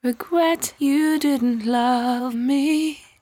74-5bpm B, Velvet Vocal; While U Could; Zero-G

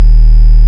SICK D#1, BASSES; Best Service Alpha Dance vol.2

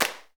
PRC XCLAP, Hand Claps; Club-50 - Foundations Roland; Snaps

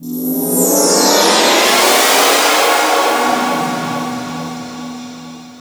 CHORD, 160 BPM, Drum & Bass; Ueberschall; Drum & Bass Flash Trackz

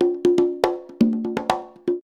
120 CONGAS, Percussion Loops; USB Soundscan vol.36; AKAI

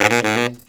Killer Brass Riffs, BARYT; USB Soundscan vol.29 - Killer Brass Riffs; AKAI

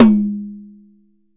YAM 8X8, Drums; Big Fish Audio; Ross Garfield Drums VOL-2

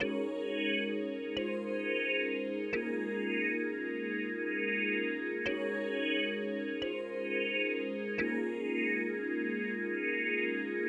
PAD, Hip Hop and R&B Drumloops; Best Service ProSamples vol.01; AKAI

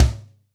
Jazz Kick; Roland BK-5

